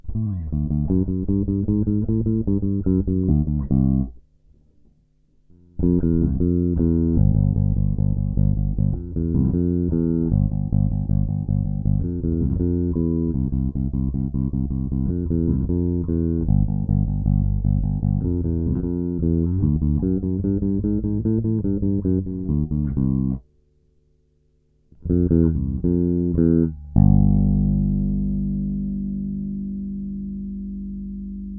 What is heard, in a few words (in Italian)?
entrambi dita chiuso